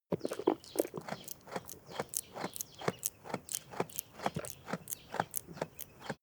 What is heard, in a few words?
goat chew